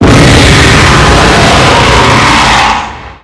roar; rathalos; rathian

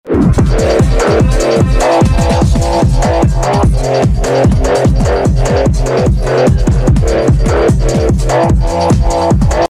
milli marsi phonk; suriye

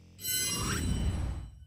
stream switch sound; ryan hall yall

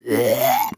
muhhhhh

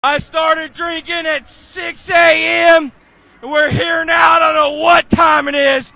drinking

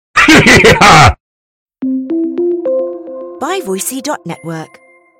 hehehehhehe

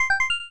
startup sound; pico-8

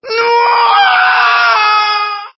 screaming; funky kong

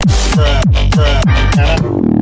call sound

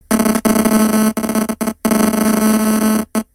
phone static

